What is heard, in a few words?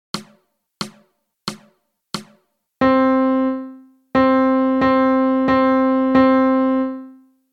Melody; Lesson 1 Medium